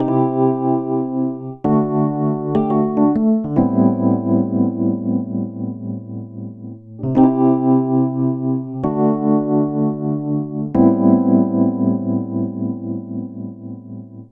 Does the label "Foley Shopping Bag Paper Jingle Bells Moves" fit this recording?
No